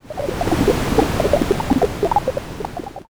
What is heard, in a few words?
underwater